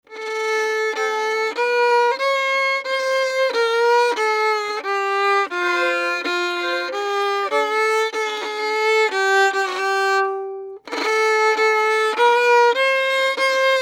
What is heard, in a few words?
fiddle teaching